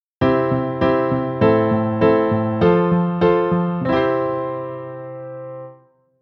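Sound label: kadenz piano plagal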